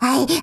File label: gonome pain